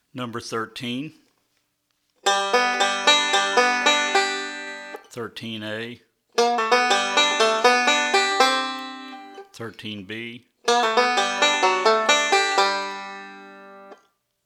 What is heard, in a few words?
Combinations Of Rolls